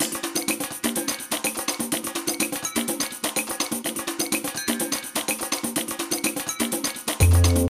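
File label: food world music paradise fight rythm x bass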